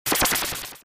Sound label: flee, battle